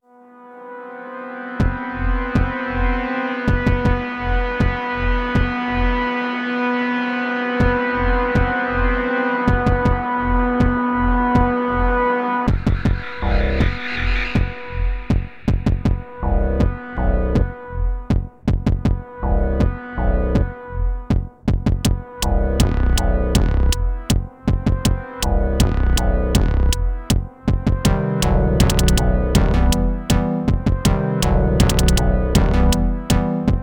dark beat 160 bpm

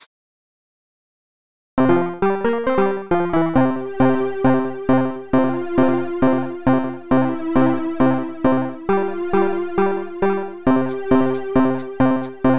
background music

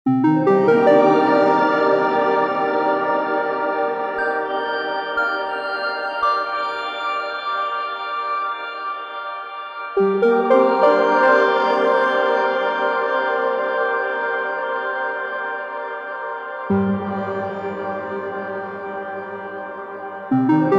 crystalos beta boot up

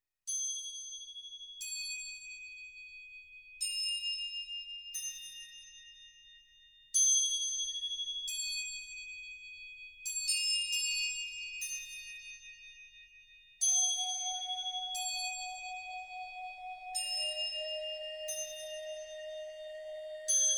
bells of joy; weeklybeats-2014

